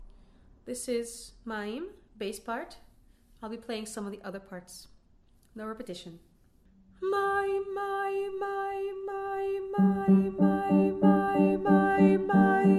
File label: Mayim Bass